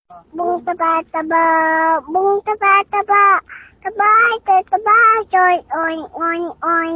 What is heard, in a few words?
boom taba taba